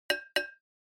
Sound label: Onoma Surprise High